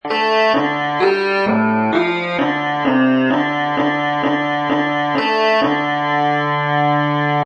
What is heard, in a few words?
Bagpipe Loop In D Major At 130Bpm; Bagpipes Short Low 2 Loop Dmajor 130Bpm; Woodwind Loops